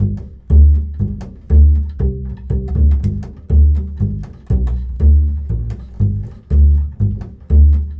Bull Fiddle, Appalachian

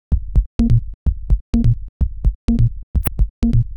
Pulse Top C# 127bpm, House Drumloops; 90 s Sample CDs; Zero-G House Fabrik Drumloops; ZG Drumloops

